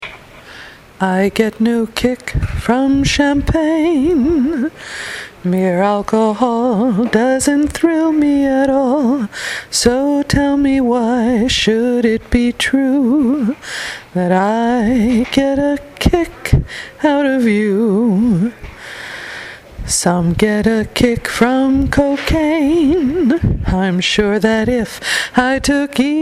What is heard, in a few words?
vocal